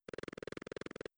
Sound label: double jump; jazz jackrabbit 2